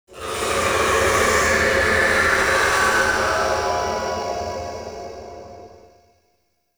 Exterior Jealous Sky Screech; Warframe; Ambience; Duviri

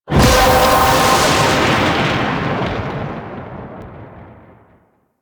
Void Tear Feed; Warframe

